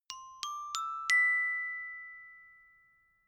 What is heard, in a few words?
notification glockenspiel dry sequence ascend